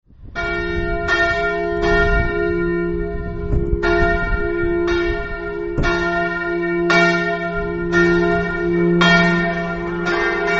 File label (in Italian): grions, campane